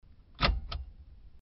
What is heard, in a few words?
click result; kho bau